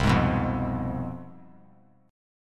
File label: C Major 7th Suspended 4th Sharp 5th, down; CM7sus4#5 strummed; JGuitar